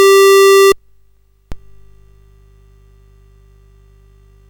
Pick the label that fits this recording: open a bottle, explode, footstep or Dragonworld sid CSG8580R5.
Dragonworld sid CSG8580R5